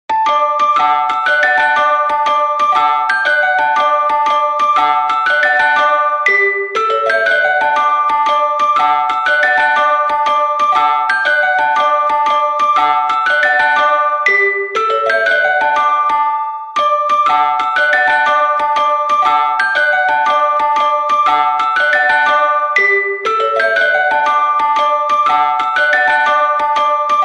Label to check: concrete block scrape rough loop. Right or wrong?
Wrong